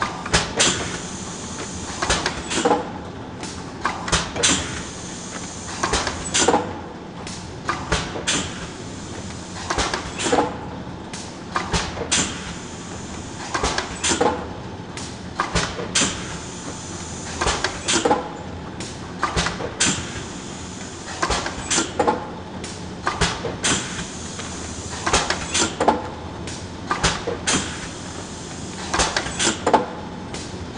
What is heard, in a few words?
ambience industrial